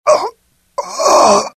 death, pain; mohaa; dialogue

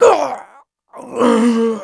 death; mohaa; dialogue

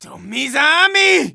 hit, pain; mohaa

